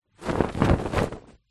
household pillow case shake

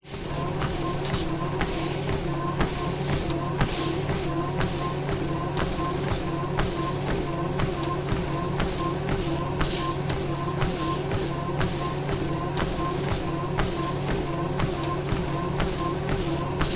treadmill walking sneakers motor whirr; sport; zapsplat

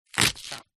leek slice knife on wooden chopping board; food

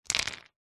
stones handful set down on tiled floor; foley

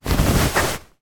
foley shorts cloth hand in pocket; zapsplat

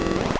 Teleportation

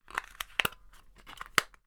batteries put into remote control

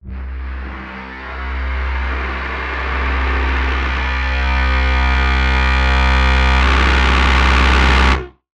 Hard Worker; martyn stonehouse

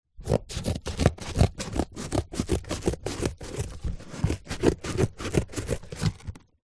office scissors cutting cardboard

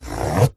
cardboard scratch rubs fingernails; foley; zapsplat

